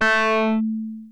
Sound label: strings